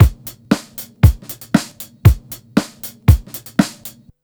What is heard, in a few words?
BEAT, Roots Disco; C#M; USB Soundscan vol.32; AKAI